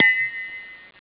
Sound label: CLINK